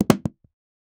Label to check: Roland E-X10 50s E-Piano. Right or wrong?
Wrong